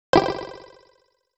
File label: victory